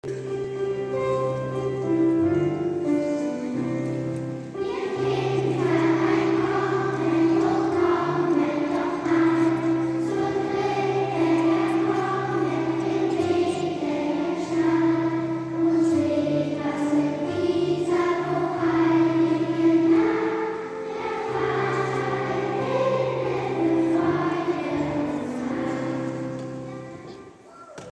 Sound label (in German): Schulchor 2. Klasse